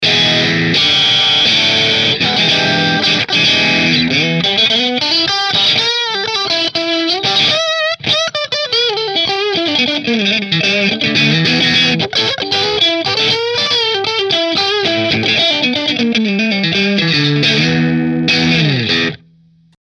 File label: Position 1 Marshall; Italia